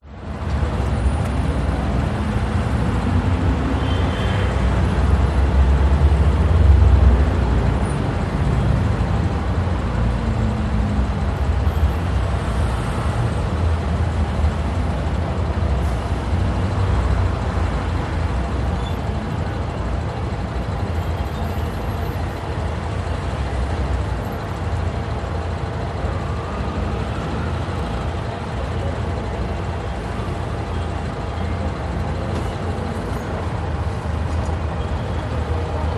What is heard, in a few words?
Bus City Transit; audio hero